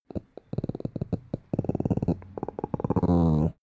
dog small growl very soft

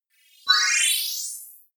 appear sparkle short

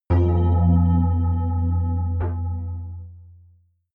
synth bass bell

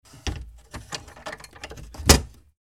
window closing

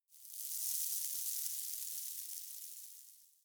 desert oneshot sand

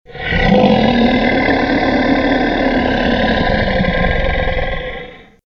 danger growl

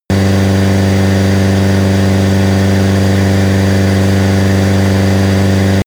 engine high speed

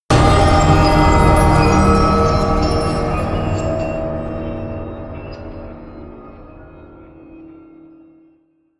distorted bell high

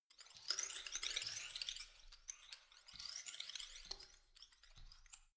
organism short

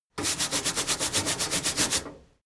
mechanic sink brush long

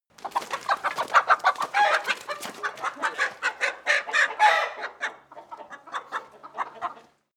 chicken cackle long

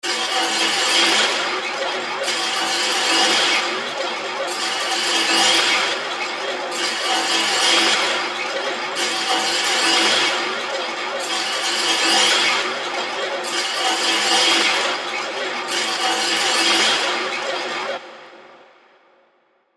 bowl fx

